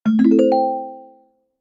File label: slow spring board; notification sounds